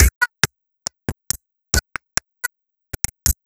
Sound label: Vocalize, Synchro Funk, Beats; Sonic Foundry (Sony Creative Software)